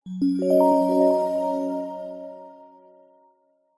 multimedia game sound relaxing game soft twinkling chimes positive tone